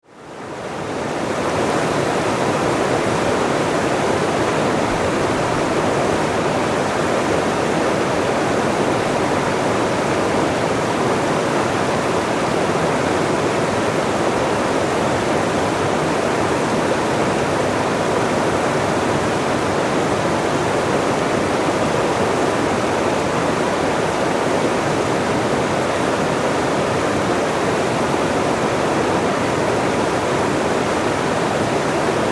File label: mystic falls